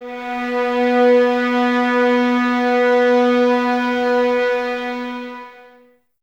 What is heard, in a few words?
violins B 3, string ensembles; Miroslav Vitous; 11 Violins